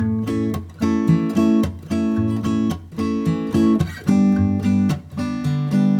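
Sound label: guitar rhythm excerpt; gtr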